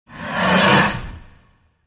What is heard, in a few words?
dragon red attack voice